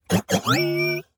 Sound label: triumphant beeps; 堡垒（守望先锋2）; 局内触发; 扬扬得意的哔哔声